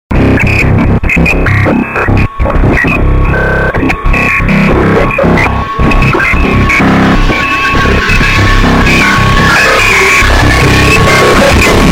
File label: schizophrenia alert theme; zerosiki; slender